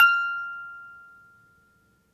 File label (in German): spieluhr; notes; Terrarum